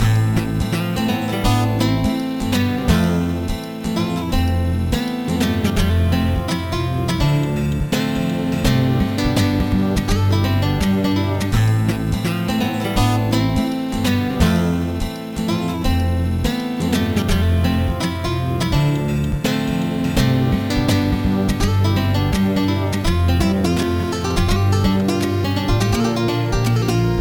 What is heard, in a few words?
guitar ballad; Heywood; Trackers; Modland; guitar ballad2